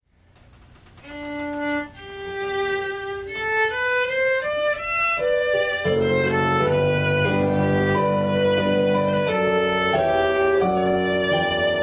Piano and violin prelude, Opening worship

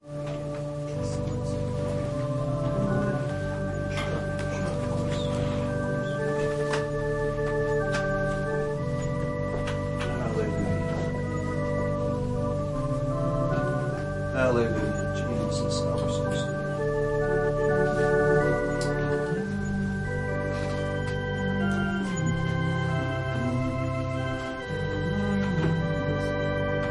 Organ and violin prelude

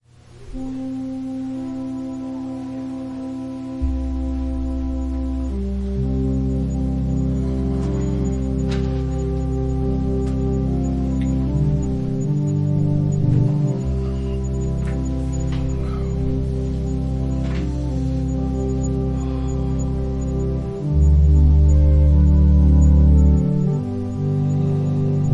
Organ prelude; The perfect marriage

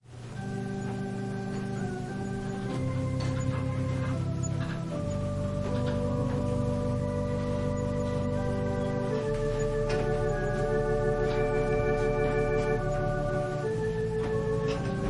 Organ prelude

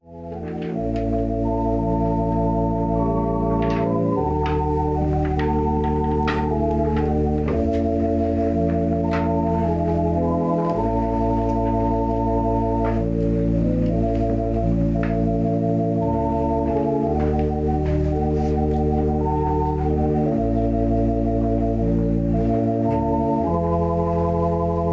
Organ prelude, Opening worship and quiet waiting

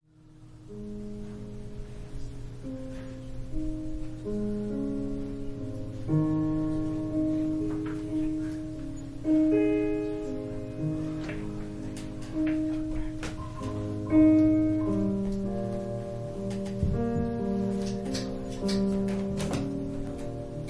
Piano prelude; Praising continually

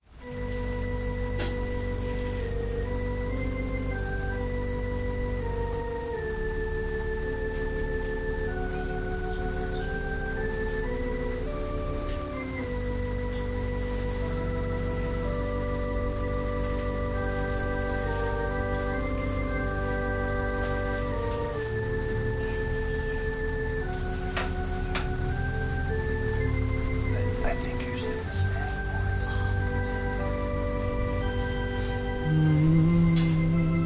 Organ prelude

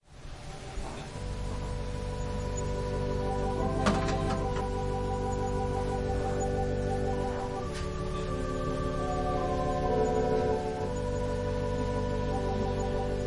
Organ prelude

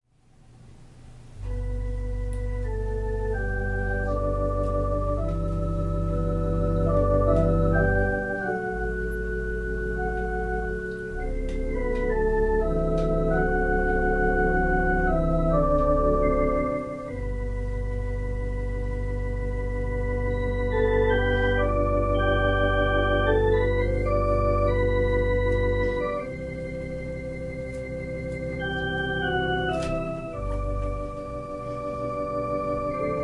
Organ and violin prelude

